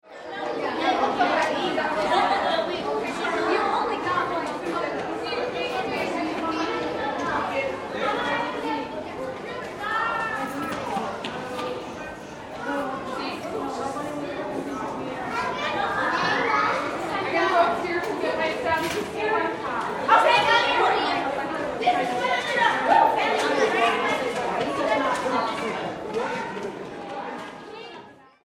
Ambience High School Hallway Students; Backgrounds

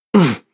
Human Fx Grunt; Andrew Fleming

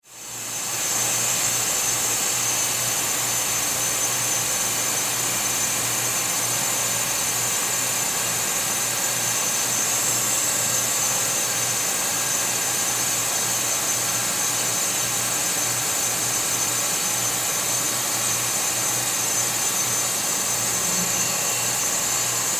Water Filtration Room Ambience; Factory; Industry; Factories; Sound Effects:Backgrounds; Blastwave

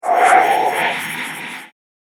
Light Speed Whoosh; Whoosh Sound Effects; Accent Sfx For Whoosh, Wherever Swish, Sweep And Swoop Sounds Are Required; Andrew Fleming

